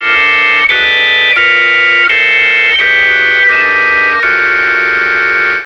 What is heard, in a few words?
organ